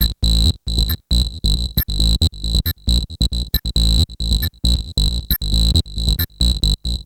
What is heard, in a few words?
Acid Bass